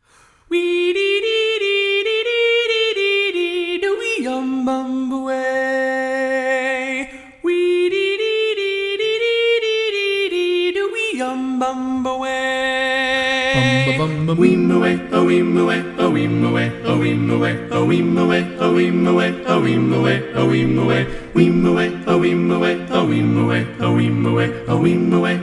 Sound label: TTBB